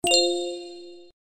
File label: Fluid, notifications